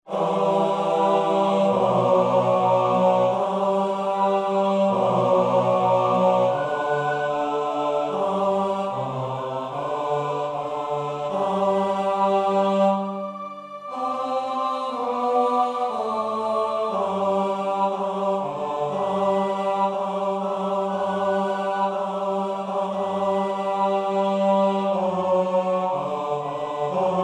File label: Bass